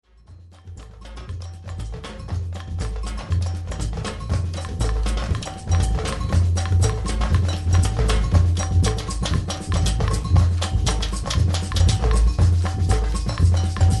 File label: Batucadax